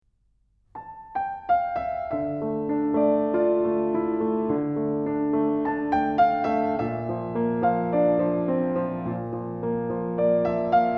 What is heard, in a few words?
pop preludes